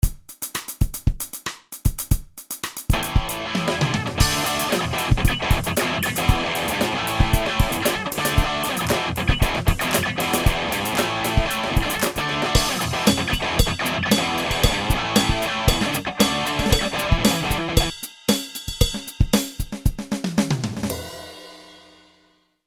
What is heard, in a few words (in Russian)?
Gitara i udarnye nu tam kakto